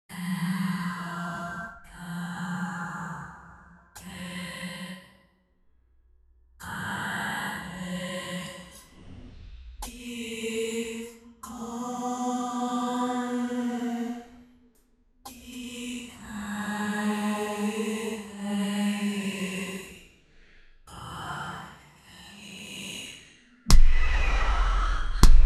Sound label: a dark evil spell with, ceremonial